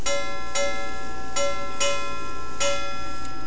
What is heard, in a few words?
chime